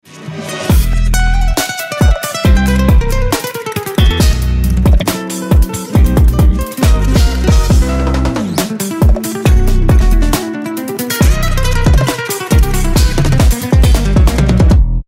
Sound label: main melody